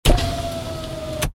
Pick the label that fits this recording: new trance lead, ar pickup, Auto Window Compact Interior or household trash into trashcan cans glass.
Auto Window Compact Interior